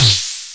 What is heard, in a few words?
SD Snare, Simmons SDS5 drums